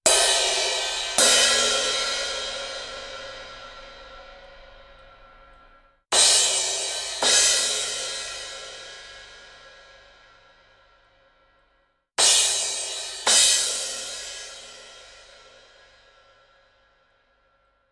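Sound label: cymbals, tube; lewitt; lct340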